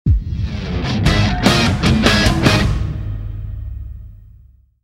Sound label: Rage (Sting); Narco Terror gamerip 2013